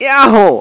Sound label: yeowww; wanted